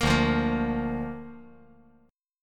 D Minor Sharp 5th D down

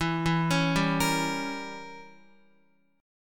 E Diminished 7th E, Guitar

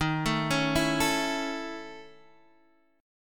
A Major D sharp; arpeggiated; JGuitar

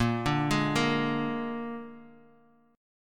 Bb 7th Bb down Guitar 4E,4A,5D,5G,5C,6F; Bb7 arpeggiated

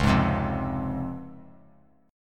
Db Major 7th Db down; strummed; JGuitar

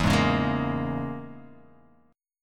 E 6th Flat 5th, down Guitar Open F; strummed; chord

